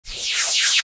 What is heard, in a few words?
giant spider shooting spi